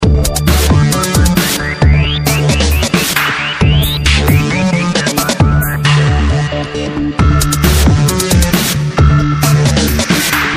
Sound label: Club